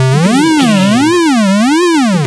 retro synth wobble

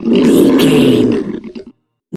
troll monster death slow

